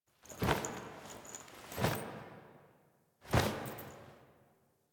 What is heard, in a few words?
Foley B; Drifter; Warframe; Quests; The New War; Lotus Gem Part One